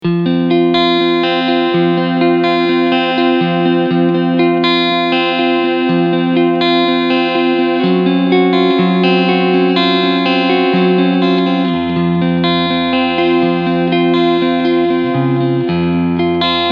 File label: Cleansound Front PU